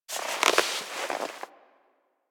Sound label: Classroom Move Foley; Ambience; Warframe; The New War; Quests; Zariman